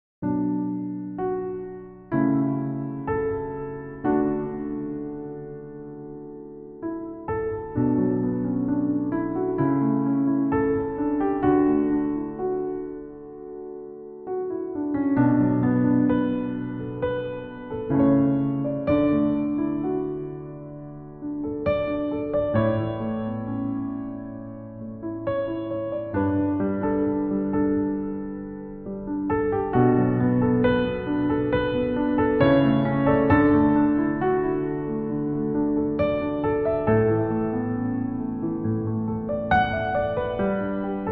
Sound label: Piano Cover